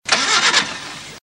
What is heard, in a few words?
engine sputtering, A small engine sputtering, in my backyard